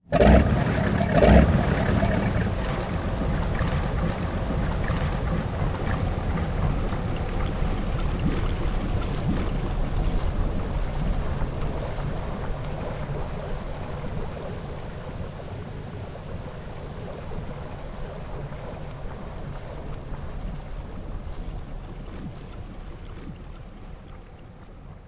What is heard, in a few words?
boat start; vehicle